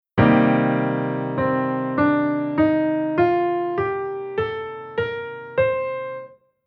Chord Poly; Jissen

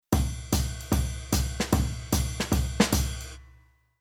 Rhythm Swing Jazz

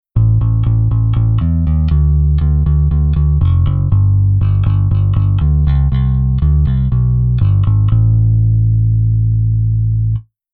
Bass Velo Finger

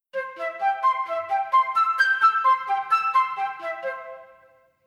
arp

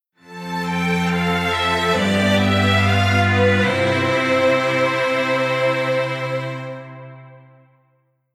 Strings Poly Hamo

